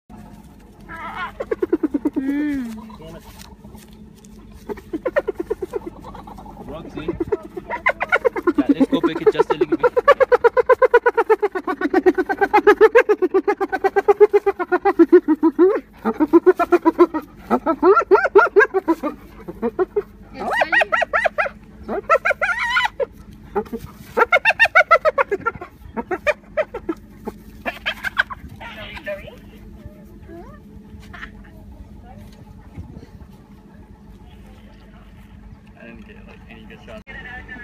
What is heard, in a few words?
Hyena Laughs Loudly for Her Meal; Roxy The Hyena